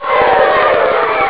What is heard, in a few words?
tv spectator; ST-XX Amiga Soundtracker Sample Packs